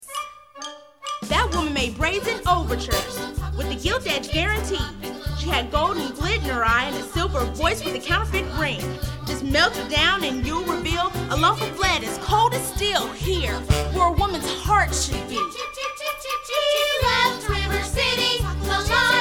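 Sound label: With Vocals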